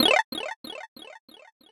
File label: fire small; sfx; cosmic protector